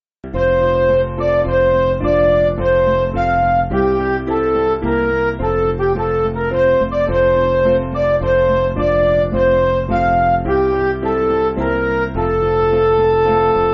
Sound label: Midi